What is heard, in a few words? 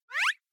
Anime Motion Short; アニメモーション; 短